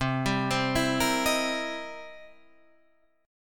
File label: C 7th Sharp 9th, Guitar, Open D